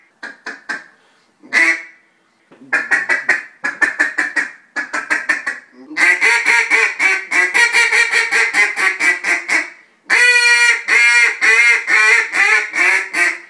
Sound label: echo open water; reclamo de pato; reclamos pato